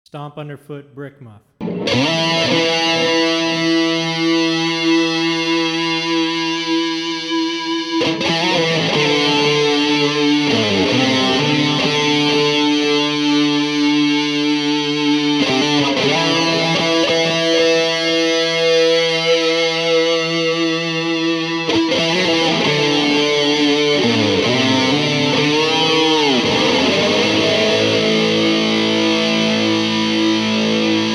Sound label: BRICK MUFF VS BRICK MUFF CS2 TubeDriver CE2 TCNovaDelay Intro